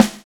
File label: swing